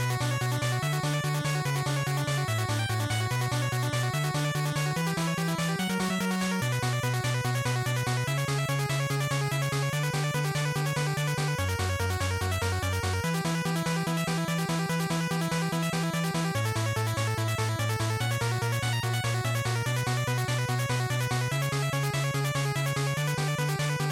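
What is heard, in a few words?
Game Boy Action Boss Loop, bgm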